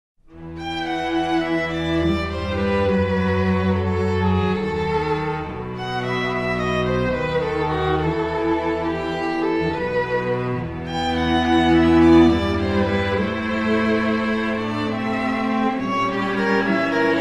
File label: ring